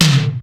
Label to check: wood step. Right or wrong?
Wrong